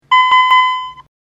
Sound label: beeps; esphome-vistaECP; esp8266